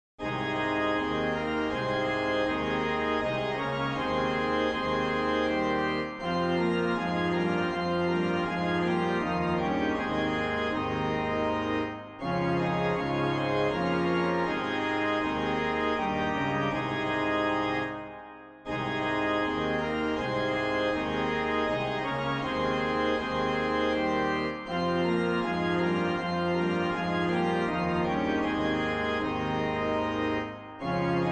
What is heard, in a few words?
Organ